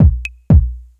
4 floor beat, trance

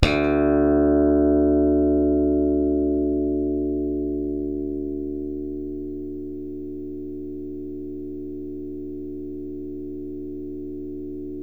SLAP BASS, Electric Basses

